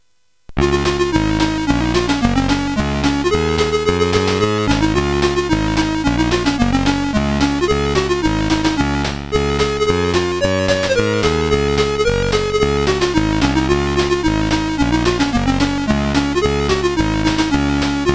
sid CSG8580R5, GAMES; Aussie Games

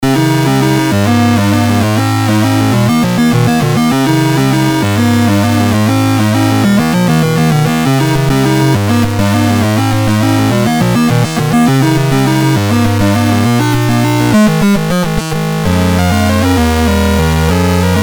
BASIC, MOS6581R3; Commodore

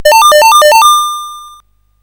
sid CSG8580R5, GAMES; Cavelon

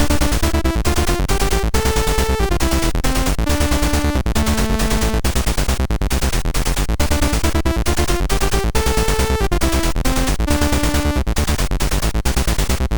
MOS6581R3, GAMES; Strike Fleet

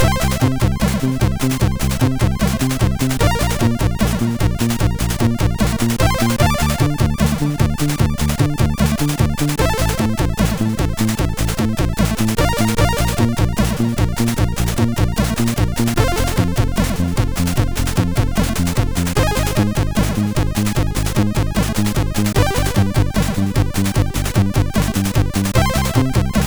highscore, MOS6581; MOS6581R4

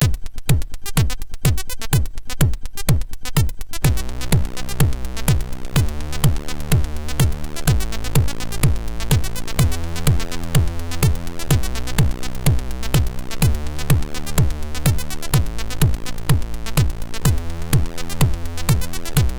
MOS6581; MOS6581R4

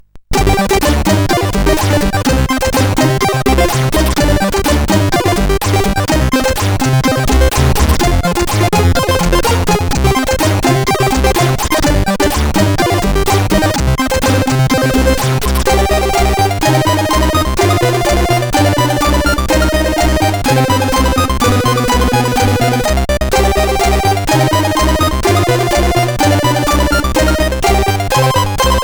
Tetris, sid CSG8580R5

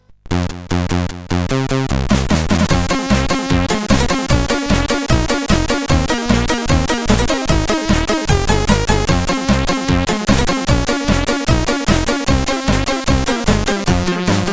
Tropical, sid CSG8580R5

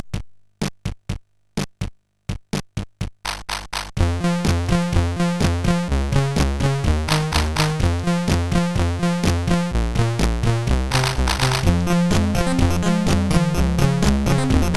sid, MOS6581R4; Amiga Strippoker

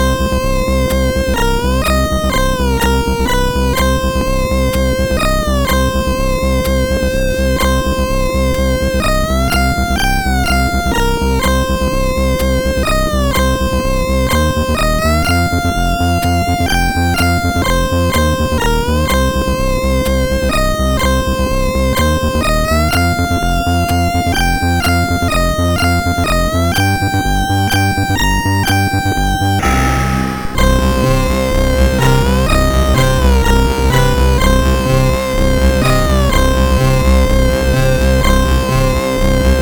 Kung-Fu Warrior Ditty, MOS6581

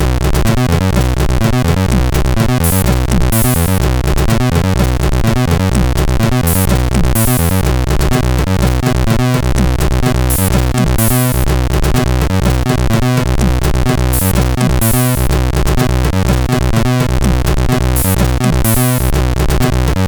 Sumdrums, CSG8580; hvsc

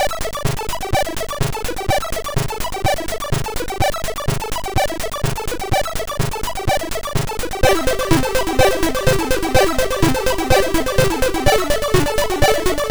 Intro sid MOS6581R4

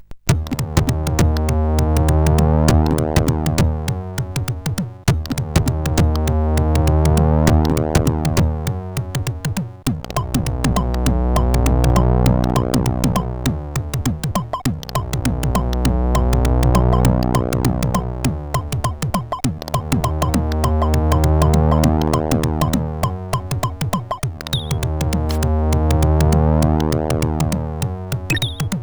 Jungle Drums, CSG8580R5

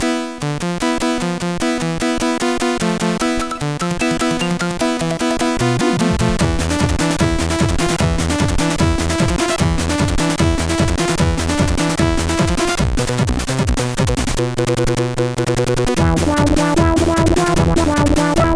Pianotech, CSG8580R5; Nordboe Kjell

